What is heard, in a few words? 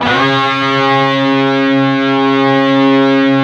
PRS, Guitars, PWR SLID